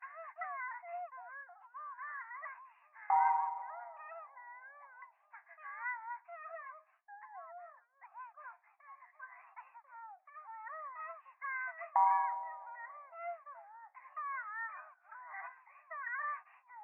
電話 可愛い喘ぎ 神社, ASMR

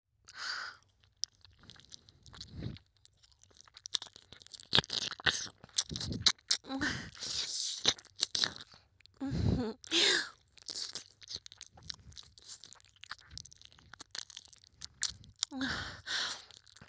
耳舐め, ASMR; SEなし

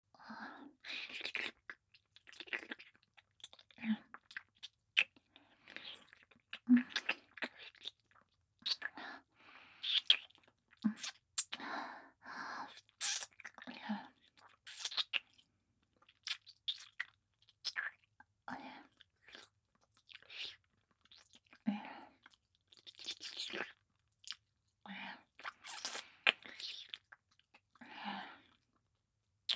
右斜め下・乳首舐め・普通; ミーシャ

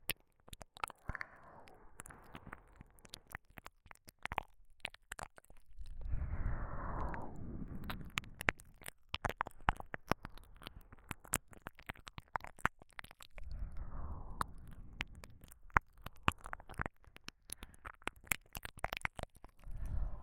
左耳舐め ループ対応

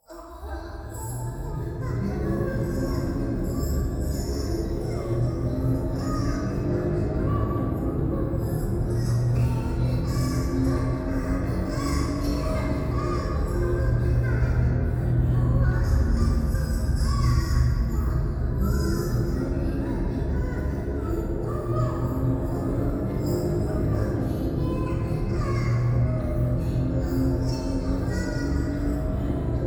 風呂 低音喘ぎ 車の中, ASMR

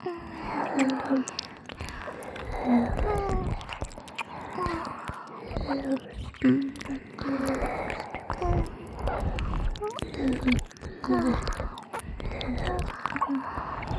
キス ＋ 両耳 舐め; おまけループ（オナサポ）; 3WAYループ

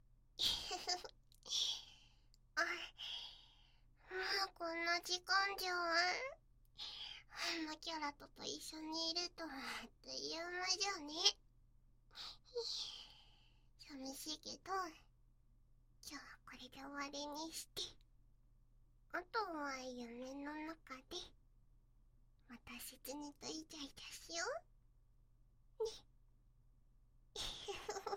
導入耳舐め

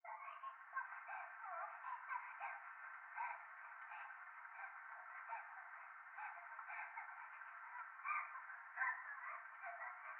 トイレ 可愛い喘ぎ 雨

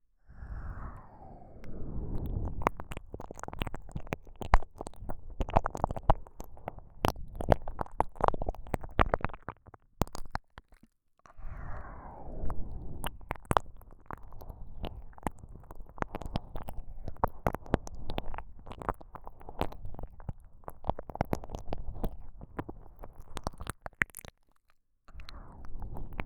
右耳 最奥舐め; KU100

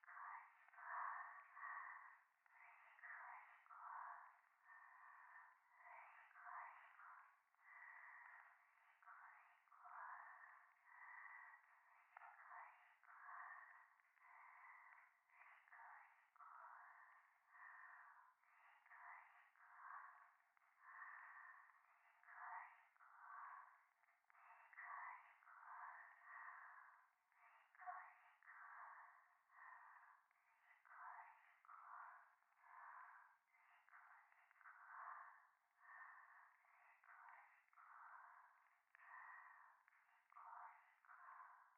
しこしこ 暖炉; 夢の中

